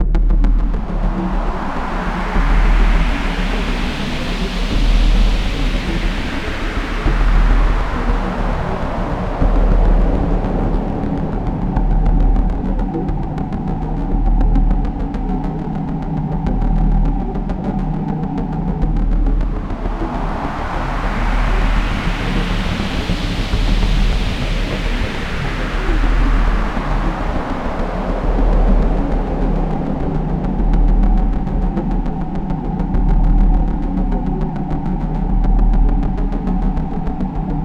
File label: mood; First Person Slapper